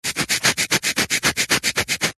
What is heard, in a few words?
abrasive foam rub clean or sand concrete